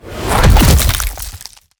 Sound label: Ice Spell Damage Direct Glacial Fist; Arctic Aura